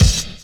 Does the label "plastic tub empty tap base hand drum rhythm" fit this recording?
No